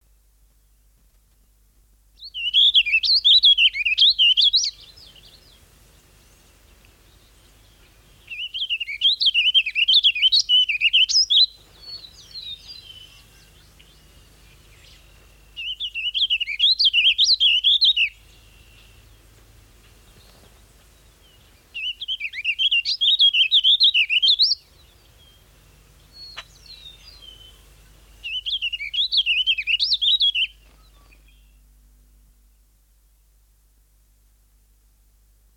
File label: warbling vireo, songbirds